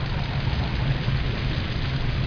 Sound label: fire; tfc; ambience